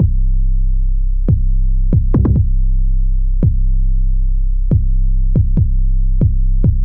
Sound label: 808 Bass C 70 bpm, Trap Drums; Vortex; Trap Drums by Sound Oracle; Ableton; Factory Packs